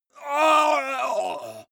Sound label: male groan painful